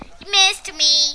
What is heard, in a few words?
missed; 3D Game Programming All in One